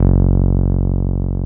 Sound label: TB-303